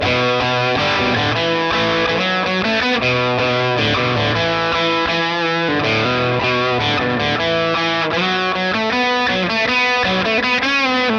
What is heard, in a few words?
flying riff, tweed; str-tweed